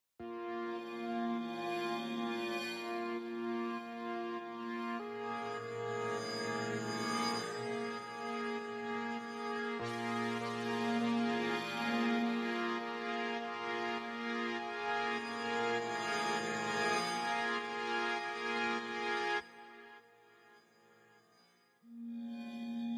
Hacking Ambient

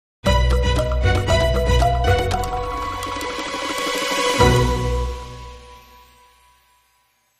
Dramedy Sting

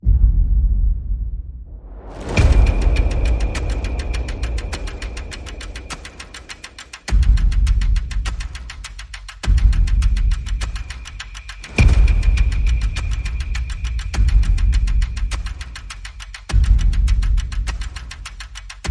Percussion and FX

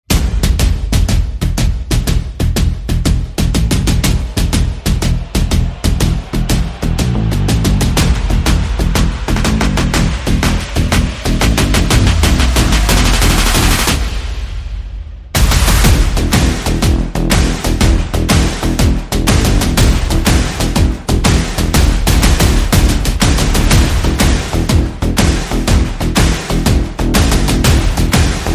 Fire It Up, Percussion Bass and Effects

